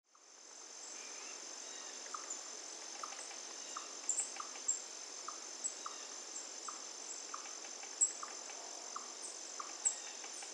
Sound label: woods